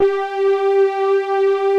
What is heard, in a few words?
SYN KEY SOFT; Pro-Rec - Synthsonic; Z1 PADS